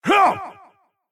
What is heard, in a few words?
MobVoice Black Knight Attack